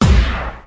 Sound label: vinyl scratch down; Punches Hits Discoblasts